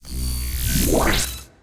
Unstable Charge

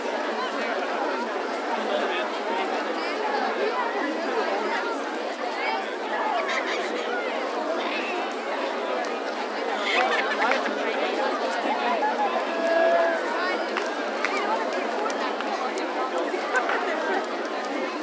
Crowd; OpenRCT2

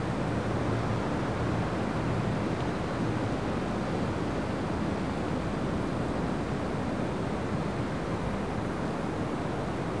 Ambience sky castle

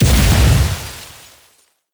Building Part Smashed

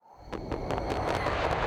Wasteland Battle Intro

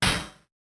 Shield

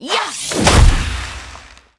Cleric F attack

